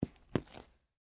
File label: Dress Shoe Single, Step, Concrete; Footsteps; BlastWave